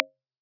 violin symmetrical IR post-processed by cepstrum method